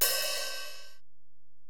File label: HiHat, Alesis DM-PRO